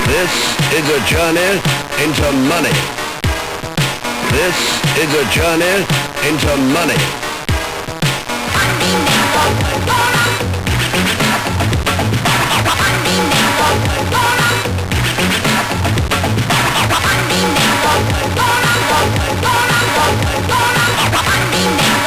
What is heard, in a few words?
Cashmix, MOS6581R4